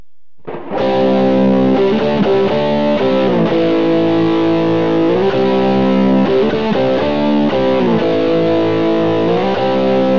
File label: neck crunch; Burny